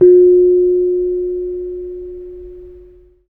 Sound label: KENONG F4; Spectrasonics; Heart of Asia